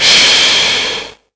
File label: jet